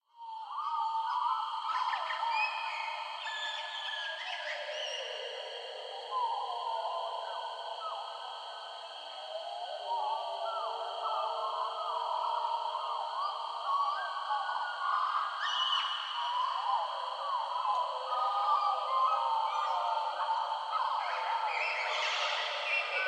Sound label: Jungle Night Atmosphere - Various Night Bird And Animals, Light Crickets; Hollywood Edge; sound designer's toolkit v2